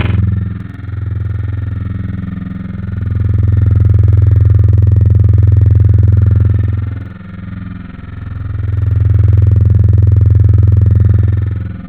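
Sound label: Vocal Digital Choir, wavetable; Synthetic's Serum Collection; Echo Sound Works Core Tables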